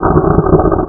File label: skip